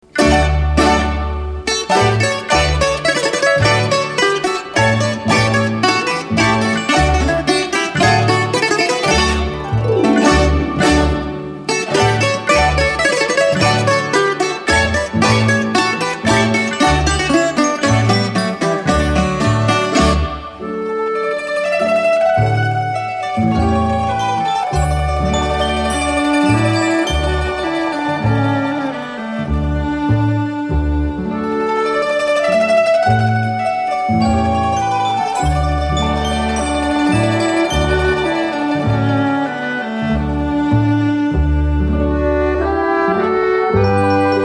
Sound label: bouzouki, Greece